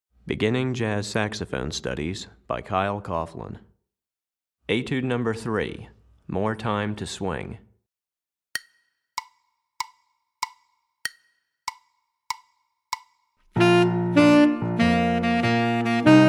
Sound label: Tenor Etude, Saxophone; Etude #3; Recordings For Beginning Jazz Saxophone Studies for Tenor, Volume 1; More Swing Eighth Notes; Kyle Coughlin